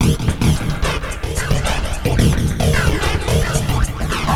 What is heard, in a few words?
Loop; Sonic Foundry (Sony Creative Software); Esoterik Beatz; Cricket